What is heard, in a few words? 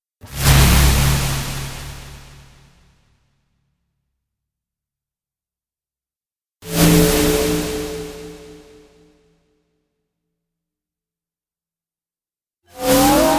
Space Zooms, Futuristic space zooms; Spacecrafts